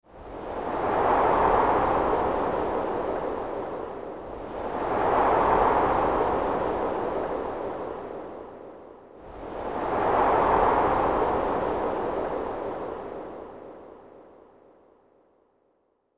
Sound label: Snow and Wind Ambience; Chilled To The Bone; The Legend of Mata Nui; Game Music; Console Games